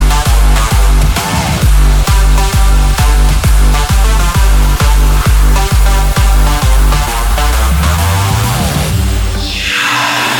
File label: jingle; hitparty